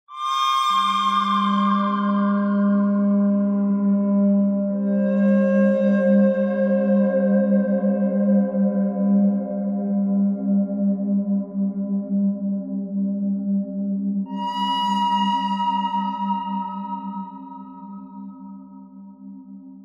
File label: bird thread pentatonic